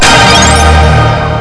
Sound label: jingle